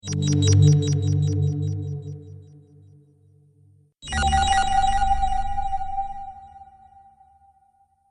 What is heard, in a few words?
Sci fi Mysterious Transitions